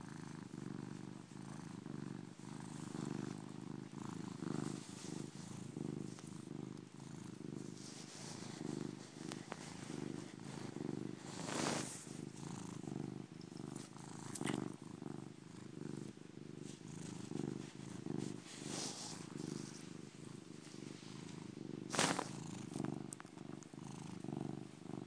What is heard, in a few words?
purring